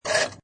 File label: Pinball Sound Motor Or Crane Move; Video Game Sound Effects